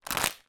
human blow air into potato chip crisp packet inflate